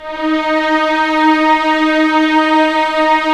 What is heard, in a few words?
orchestral strings f slow; Roland LCDP13